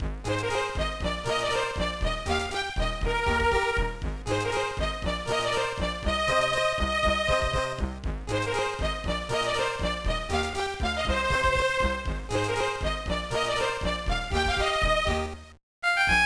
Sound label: BGM; oshare princess 2 doubutsu kyaranabi uranai; gba